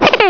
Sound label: Mother Escaped; Invaders! 1.2